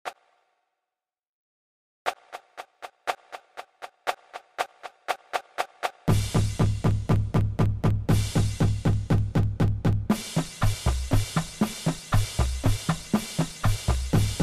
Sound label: Long Tones, drumline